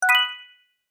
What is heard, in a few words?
Notification bell delayed ding